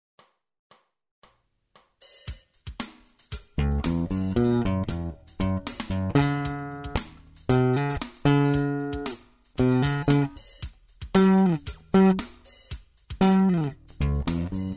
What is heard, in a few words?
Jazz Bass with Drums Treble Up